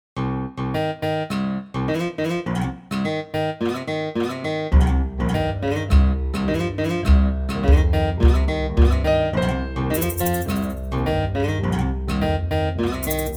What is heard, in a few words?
MIDI blues